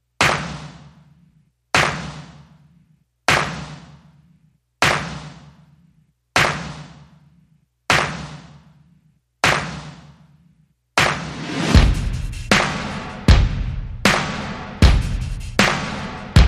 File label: Drums and Bass Only